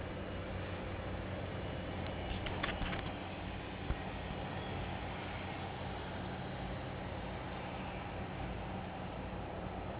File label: car, noise